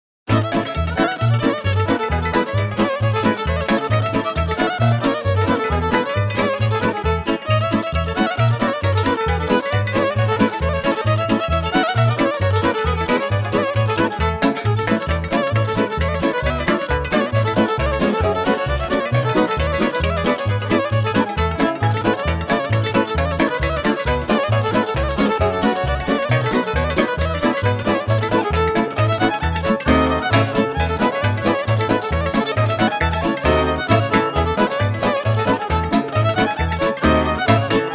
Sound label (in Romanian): Hora, instrumental, vioara